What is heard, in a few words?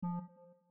error